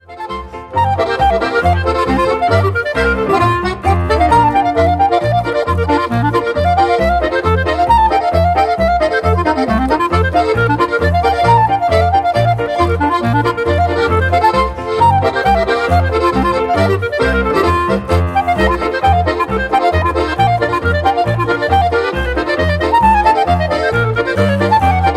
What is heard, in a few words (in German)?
KLARINETTENAKROBATIK